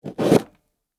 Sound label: Cut a lemon